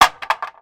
Impacts Hard - Short Wobbly Tail, Wood Impacts and Debris; Double Trouble Audio